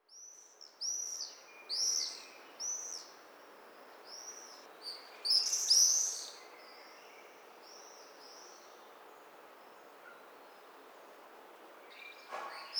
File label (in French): Martinet noir parades